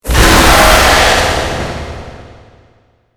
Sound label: scream; real joy of creatures revenge